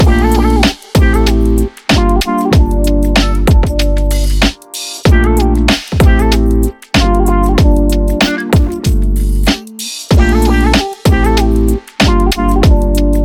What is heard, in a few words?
hip hop urban